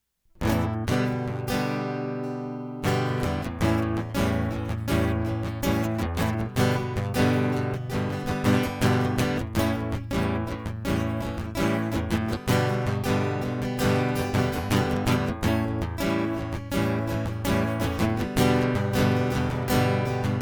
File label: Guitar Loop